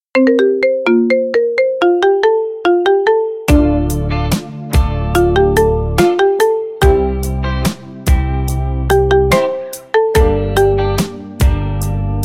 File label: marimba remix